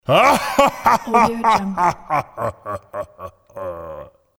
santa claus laughing

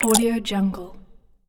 swallowing

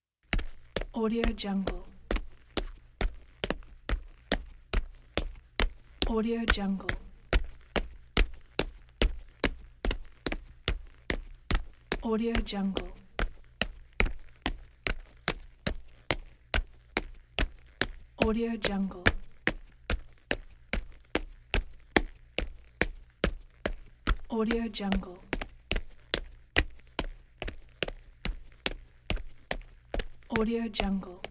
male steps slow running

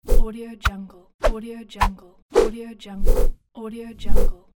swooshes

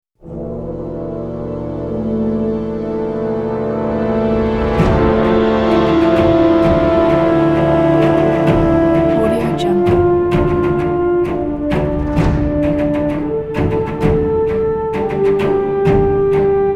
battle march; ilovemedia-es

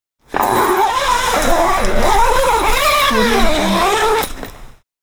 zipping a zipper

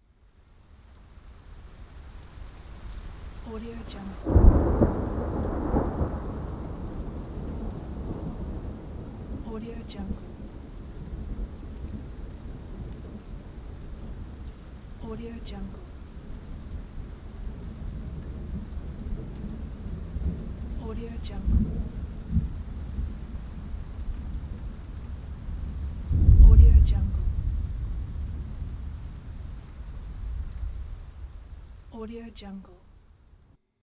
thunder and rain; erhanaarassfx

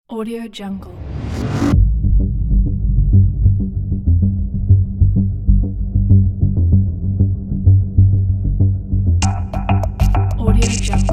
crime thriller

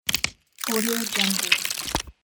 skeleton bones

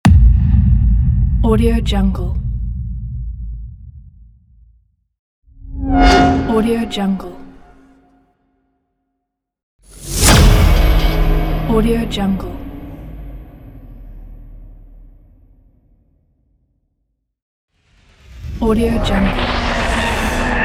loud bassdrum sound pack

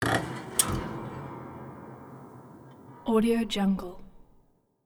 piano string abuse

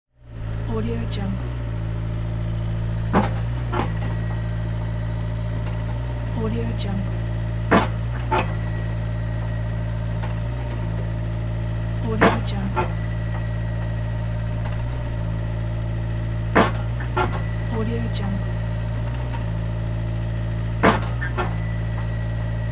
excavator working on asphault; cinematic-designs

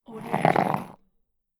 stone drag; stormwaveaudio

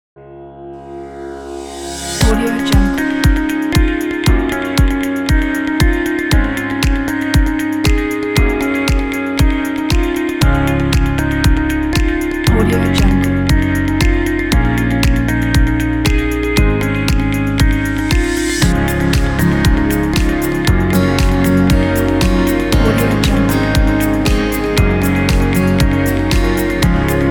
corporate insights

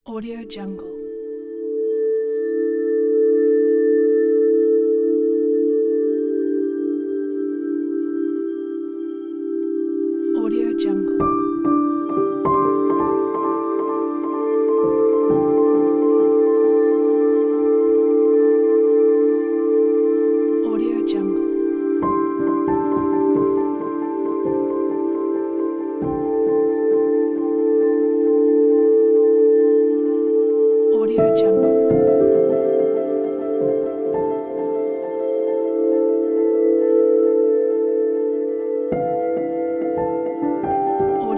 thoughtful introspective mysterious pensive

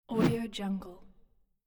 low swoosh

